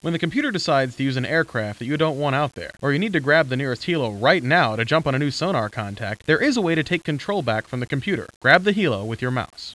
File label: HARP